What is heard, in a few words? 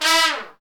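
brass, Tpts FX Falls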